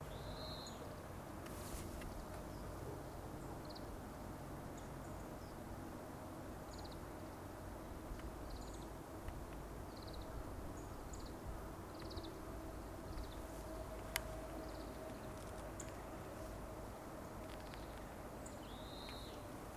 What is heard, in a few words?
bird kawarahiwa